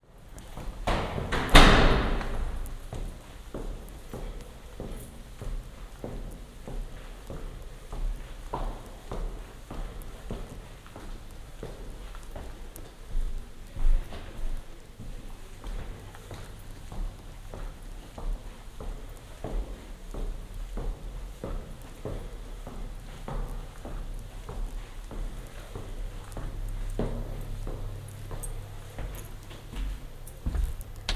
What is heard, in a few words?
Arriving Home